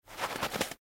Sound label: Dead Rats; Blind Quest - The Frost Demon